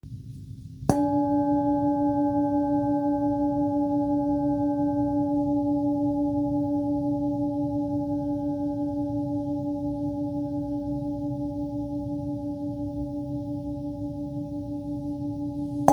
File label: Handheld tibetan D, tibetan singing bowls; hand hammered tibetan singing bowl with handle